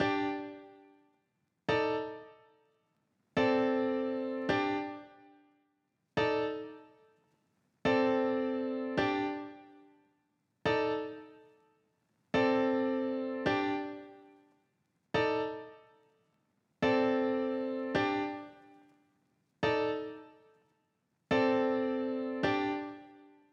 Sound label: 107 BPM G Min Piano; Stems; Cymatics; Cymatics - CHAOS Beta Pack (MIDI + Stems); Santa Monica